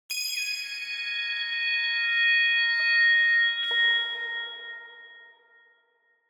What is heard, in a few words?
Harmonic Plucks Harmonic Pad - Short Very High Pad With Some Pizzicatos; Hollywood Edge; sound designer's toolkit v2